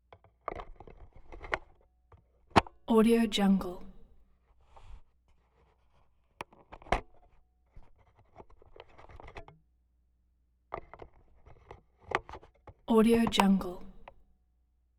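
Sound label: interior cassette tape insert eject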